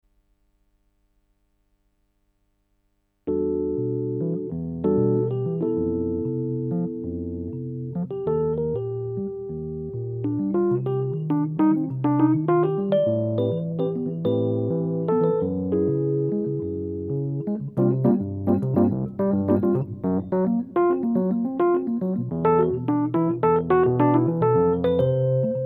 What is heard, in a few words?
Rhodes Spiralhall